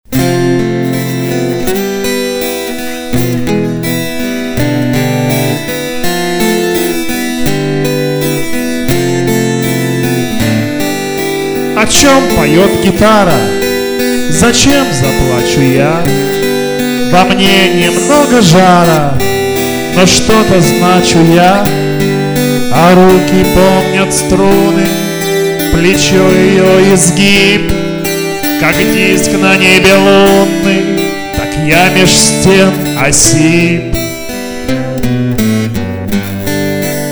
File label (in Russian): gitara